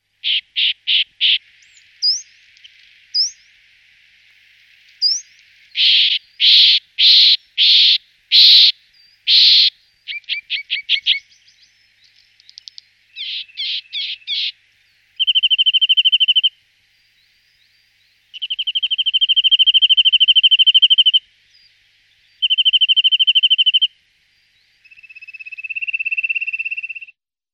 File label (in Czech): brhlík korsický